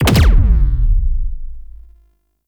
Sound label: Concrete Archgun Charged Punch; Warframe; Archwing